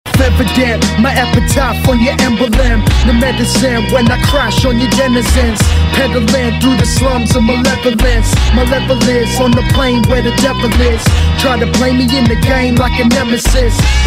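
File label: Rap N Music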